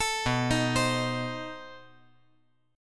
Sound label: B 7th Flat 9th B, chord